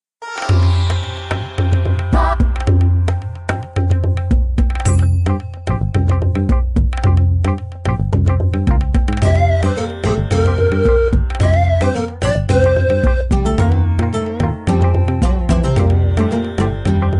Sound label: Desert; NSMBW